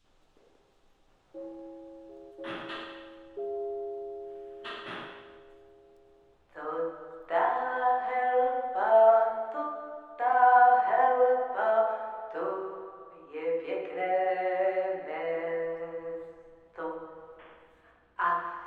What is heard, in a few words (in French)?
chant dans la gare